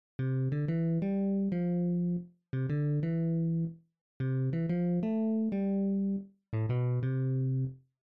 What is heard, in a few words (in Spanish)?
Bajo Escrito